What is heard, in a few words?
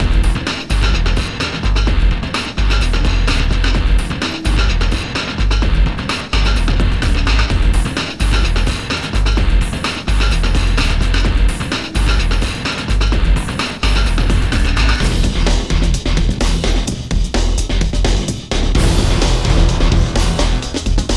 Remix; Driver Renegade